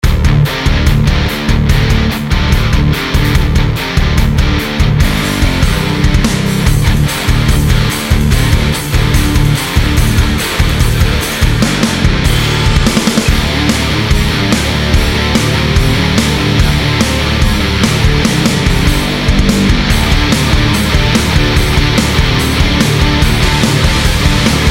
Hard Rock

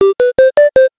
Retro; BIT.TRIP Presents... Runner2: Future Legend of Rhythm Alien

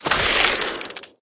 Feeding Premium food head freeze Sfx

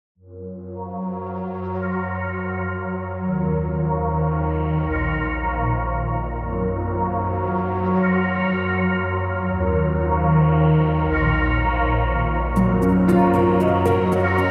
Ambient Piano Mix; We Are Eva

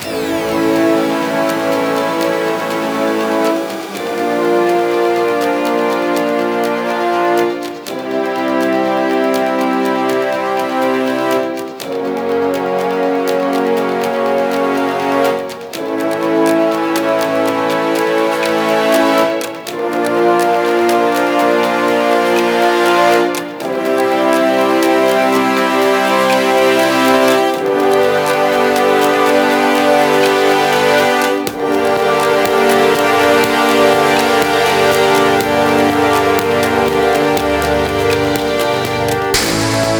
Loop 4-4 122bpm

